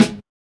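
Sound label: SD, snare drum; X-Static Goldmine 1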